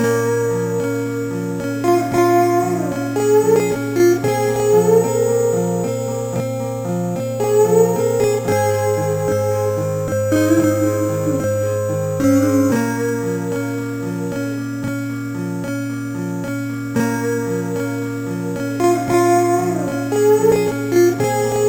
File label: acoustic tune; Beast Lord